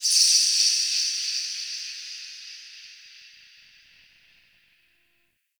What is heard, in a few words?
High Creature Echo; Ambience; Dungeons; Under City